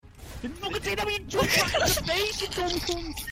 anger loop

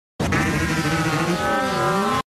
jumpscare sound; sans; horrortale

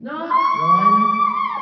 fake scream nooooo hoo

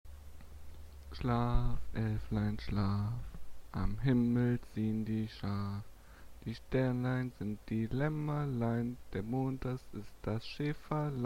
lullaby verse2

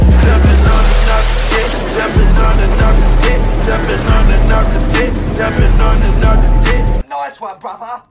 alert

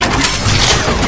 weapon transformation

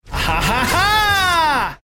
aha ha haaa

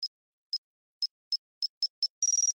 countdown

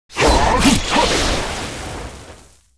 sword fencing; pixel gun 3d; sword soul card

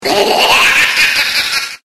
silly ahh giggle

